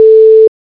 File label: alert